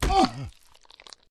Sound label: zombie hit